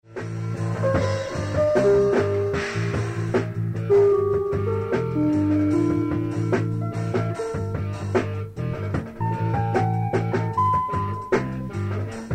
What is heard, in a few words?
Romantic Reggae